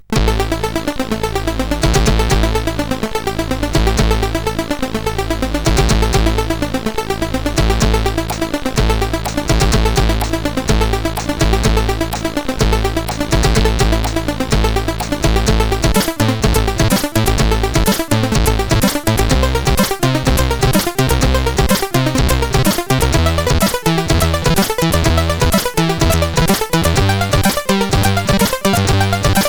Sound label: Intro Jam, CSG8580R5